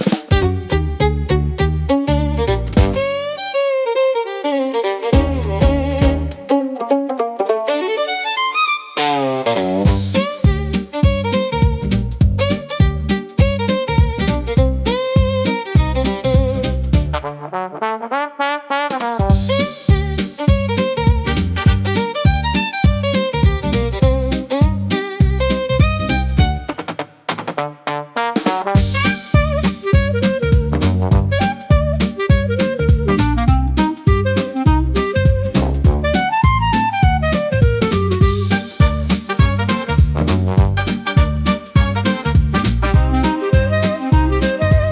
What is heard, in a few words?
Blues Melody